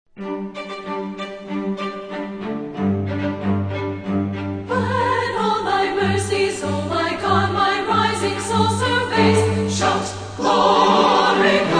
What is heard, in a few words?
Singers And Orchestra